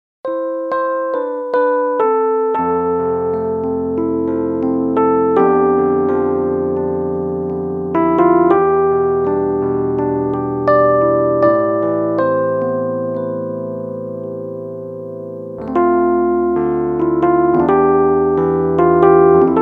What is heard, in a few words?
alesis prestige artist